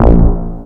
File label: BASS; SoundFactory